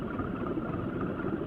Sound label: cart moving; carts